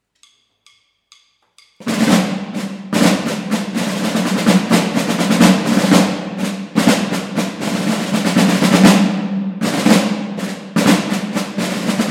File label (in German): Tambouren Silver Drum; Blasmusik; «Second Suite for Band»